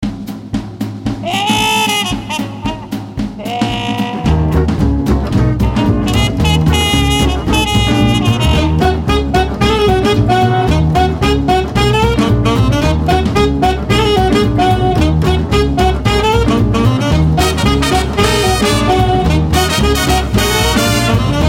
Swing Combo

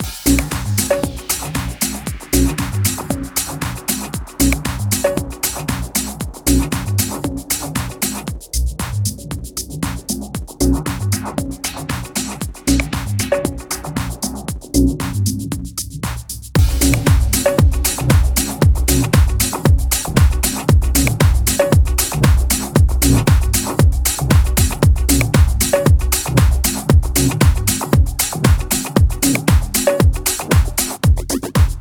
Techno Fitness

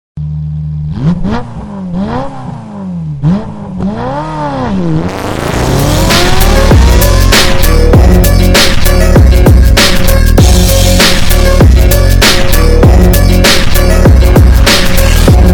Ferrari Enzo vs Bass

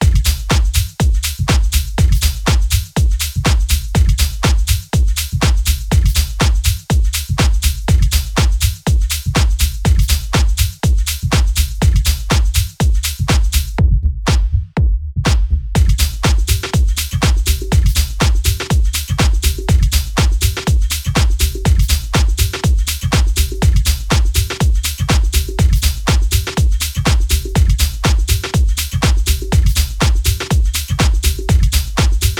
Techno

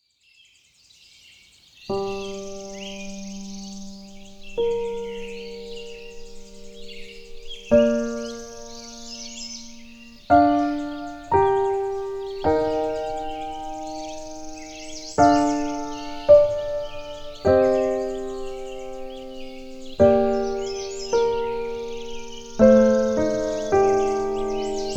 Piano and Forest

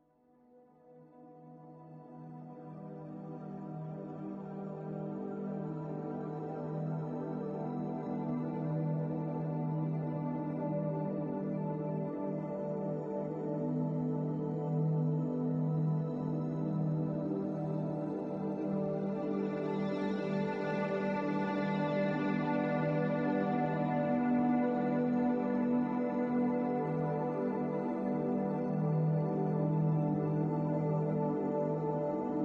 Binaural Beats Brain Waves Isochronic Tones

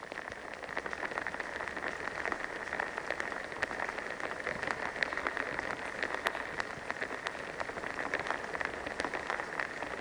Water Sound Natural White Noise; Sleep Lullabies for Newborn; Zen Meditation

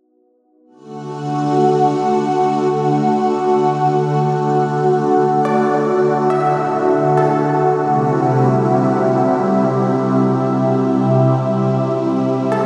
Calming Doggy Sleep, Atmospheric Sounds

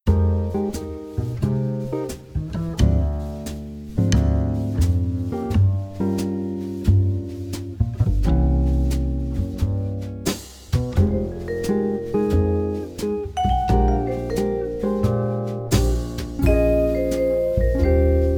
Jazz Band

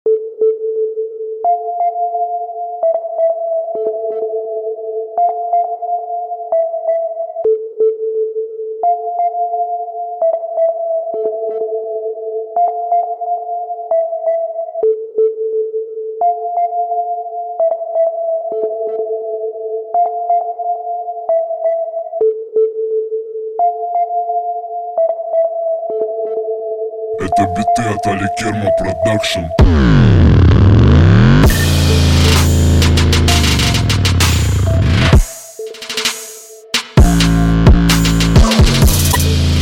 808 beat

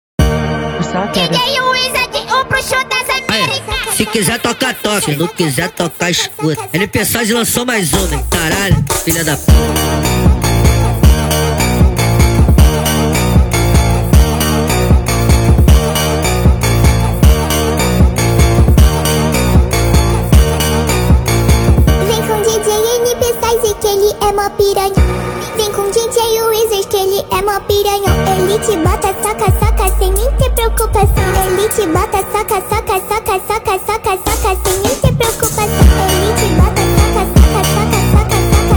brazilian phonk NIGHTCORE

